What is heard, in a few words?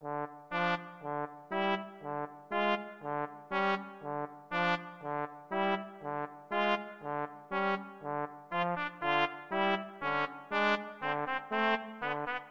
tchaikovksy sugar plum TPT TB, trumpet trombone duet